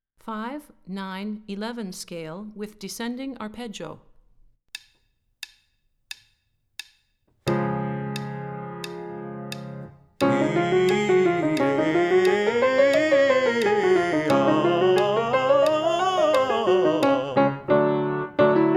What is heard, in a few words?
Five Nine Eleven Scale High, warmups